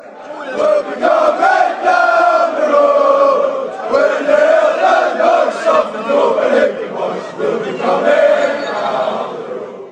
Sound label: chant, fanchants